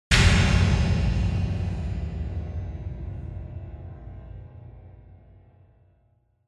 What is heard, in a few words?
Onoma-Impact (High)